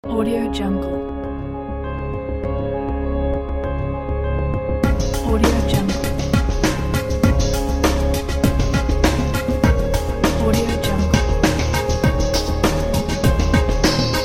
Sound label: presentation logo